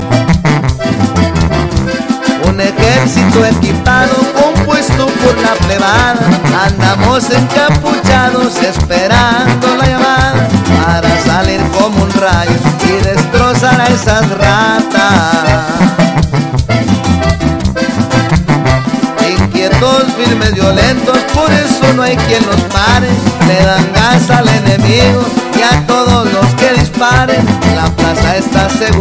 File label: laugh